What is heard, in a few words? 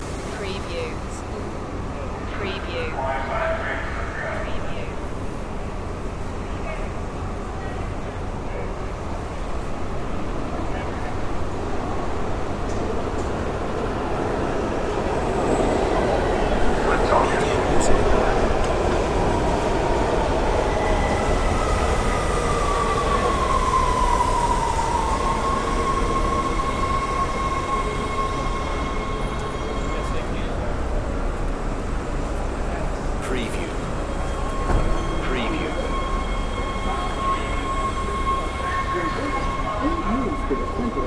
Subway Arriving